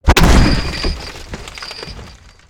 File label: Fist